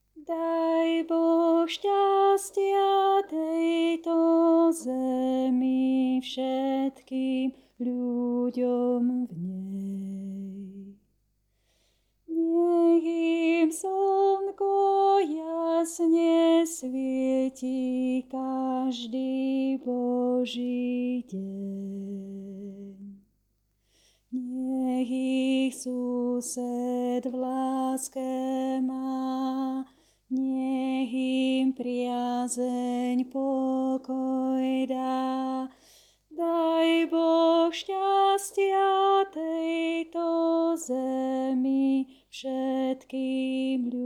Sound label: Alt, spevokol; Daj Boh stastia